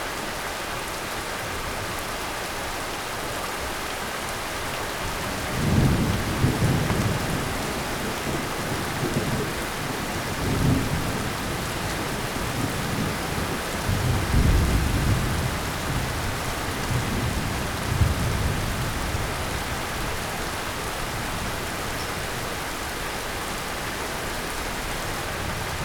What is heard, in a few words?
Sidewalk Rain